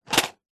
household medicine tablet pack box set down carpet